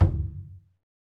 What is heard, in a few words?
Skin Drums; Roland LCDP14 Africa VOL-2; Af.Hand Drm2